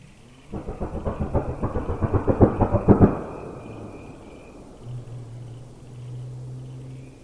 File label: car